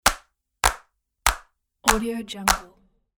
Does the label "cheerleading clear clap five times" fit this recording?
Yes